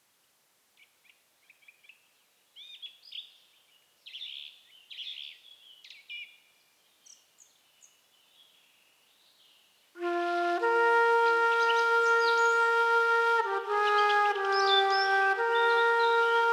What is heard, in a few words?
White Noise Therapy, Spa Music